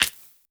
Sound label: Percussion One Shot Static Perc